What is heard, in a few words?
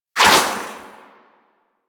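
Primary crossbow Tail; Warframe; Tenno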